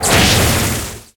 Lightning; ChooChoo Heroes

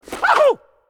White Attack

